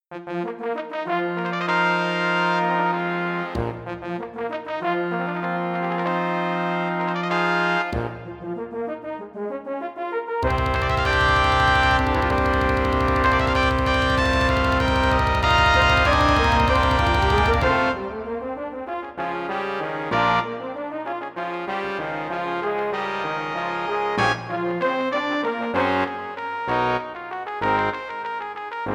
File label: solar fanfare midi